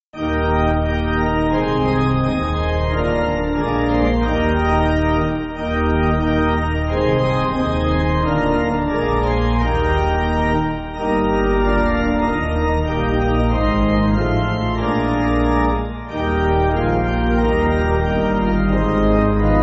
Pipe Organ, Music Backing

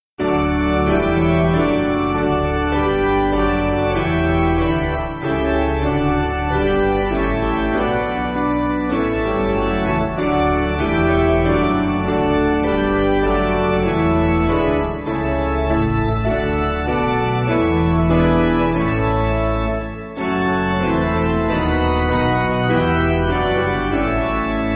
Piano, Music Backing Files for Churches; Come O Come; Te Deum Laudamus; Stainer

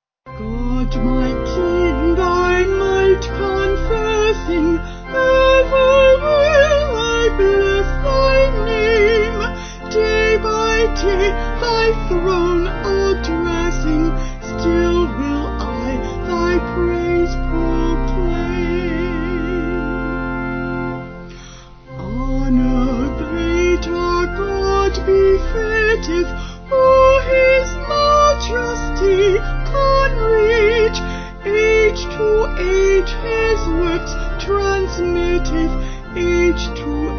Vocals Organ; God My King; Stuttgart

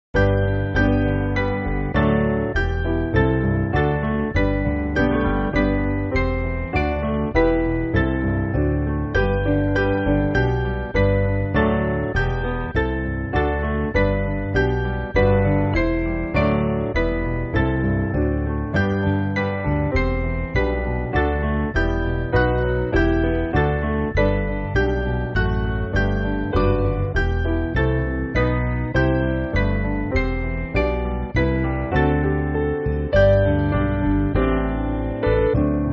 Piano; Luther

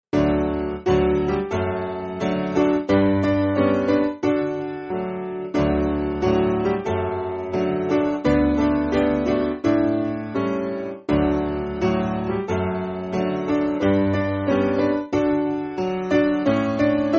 Piano, Music Backing Files for Churches; Gone From My Heart; Old Black Joe

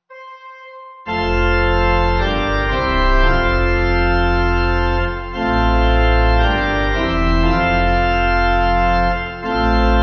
Sanctus Pipe; Communion Service

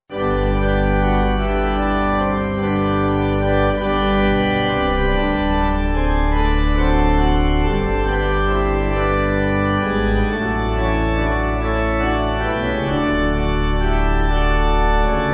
Pipe; Sing we the King, who is coming to reign

